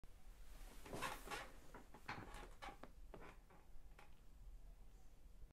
chair sitting up

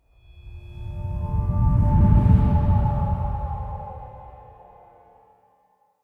Spooky Wind

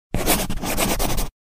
Writing Sound Effect; note writing

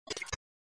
quicky metalic whoosh

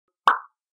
Pop

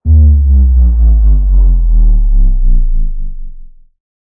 Drop Disto Sub; bass drop

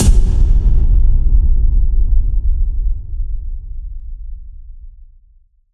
Subsonic A, subsonic rumble

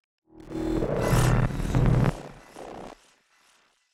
Intermodulation; intermod fx

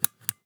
click; mouse click soft

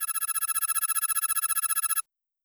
Display Digits, alarm

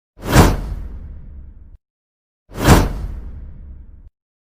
WHOOSH FIRE TRANSITION; fire whoosh fx

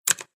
keyboard press; typing

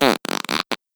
CARTOON FART

